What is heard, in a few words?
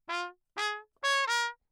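trumpet mute phrase; Bach; Perinétventiltrompete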